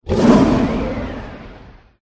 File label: tiger